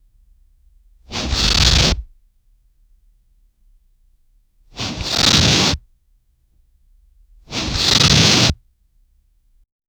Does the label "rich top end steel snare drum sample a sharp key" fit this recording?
No